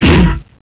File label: big hit